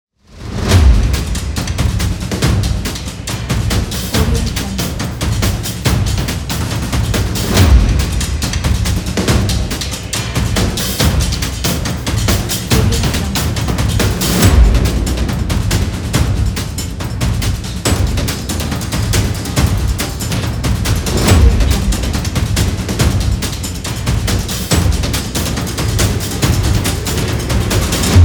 war drums action

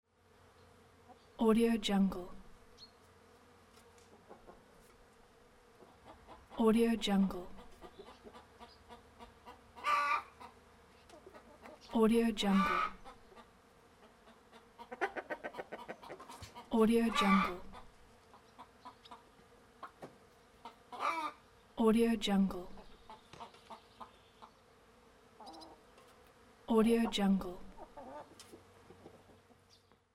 countryside with chickens

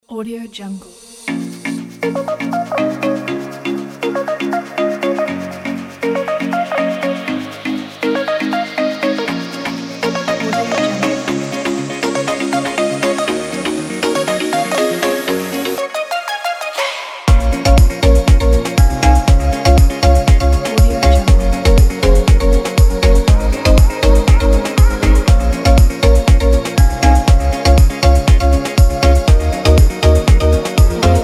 summer pop

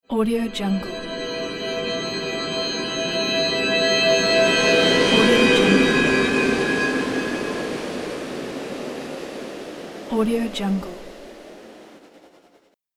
time spell of magic

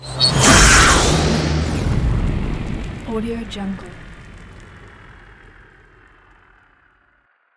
rocket launcher; soundmorph

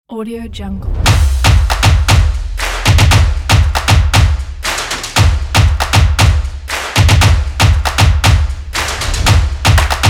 the clap

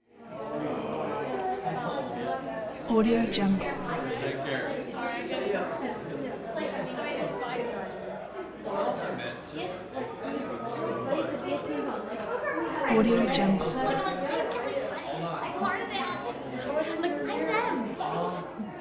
crowd walla wedding upbeat constant laughter; prosoundfx

